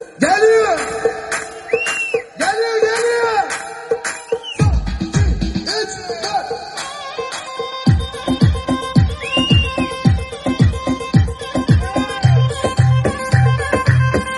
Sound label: remix bgm